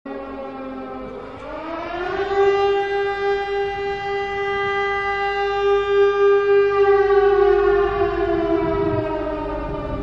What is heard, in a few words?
Horror Horn